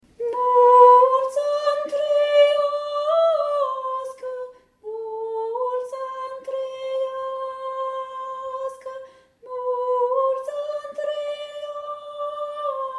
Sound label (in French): multi ani traiasca tenor